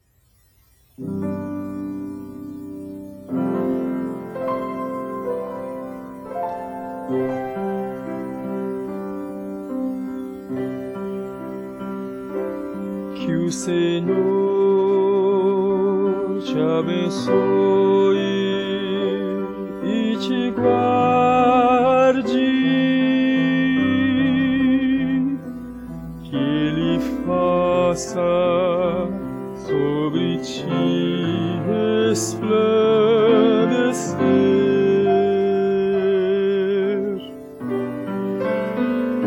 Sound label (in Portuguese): Bencao Tenor; coros; encontro sinodal de coros do sinodo rio dos sinos